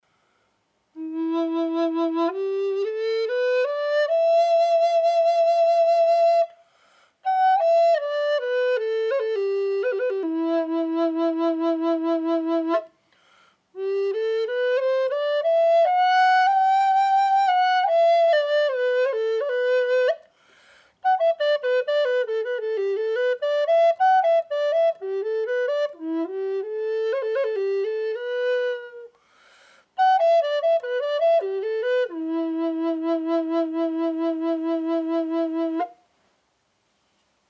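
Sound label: Port Orford E minor Dry, cedar flutes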